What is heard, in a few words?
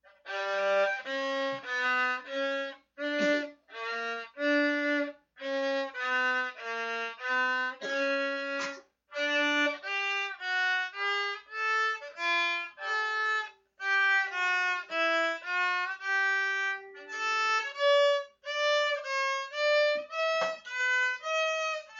violin